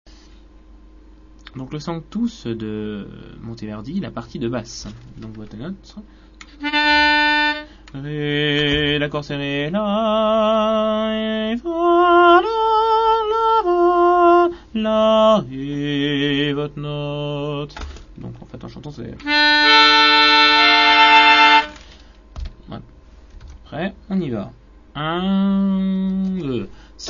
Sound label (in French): Messe Monteverdi Sanctus basses